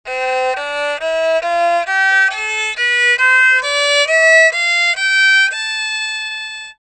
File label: Rebec